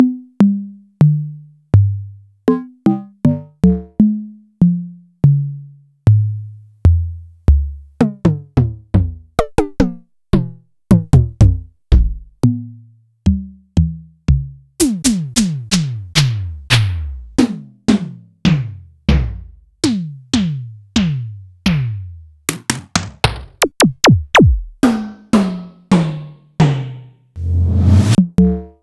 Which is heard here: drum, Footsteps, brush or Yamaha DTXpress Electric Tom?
Yamaha DTXpress Electric Tom